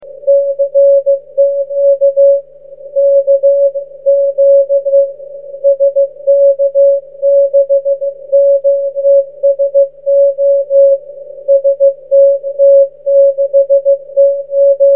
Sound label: cw, 23cm